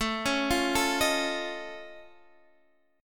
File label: F Augmented 7th A, chord